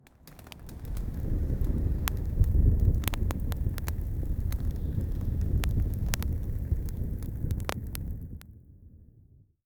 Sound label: soft yawning gentle night; twinkle